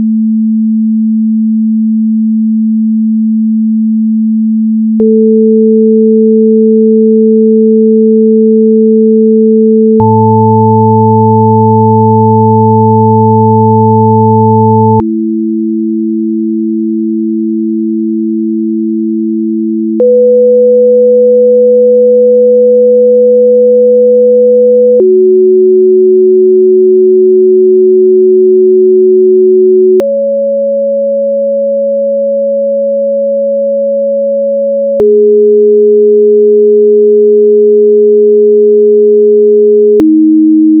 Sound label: Martian 12tet Orientation Exercise Crude